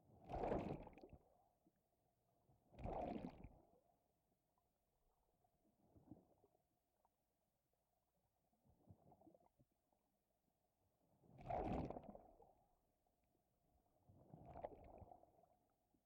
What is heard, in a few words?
underwater waves in Taquile Island Peru